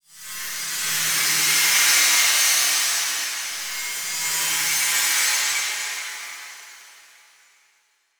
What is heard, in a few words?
Transition Feedback Sweep; Adobe; Production Element